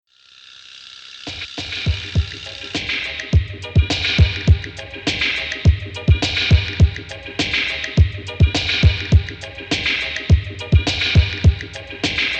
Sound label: beat reggae